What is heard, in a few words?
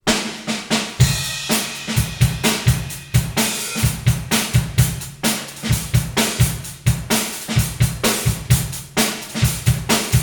drums